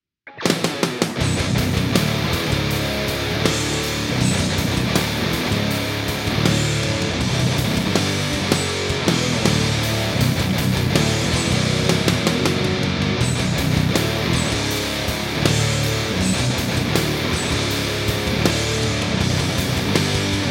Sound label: Filters With EQ